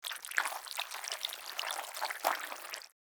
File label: Slimy organic movement wet slithering; slug and worm; squelchy and organic